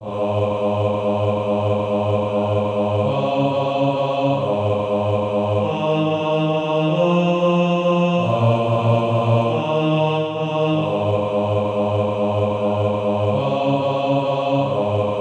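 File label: bas, Practise Music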